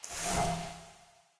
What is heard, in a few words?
brush; smite; zhongkui